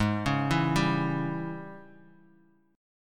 G sharp Major 7th Suspended 4th Sharp 5th G sharp, down, Bass Standard; arpeggiated; chord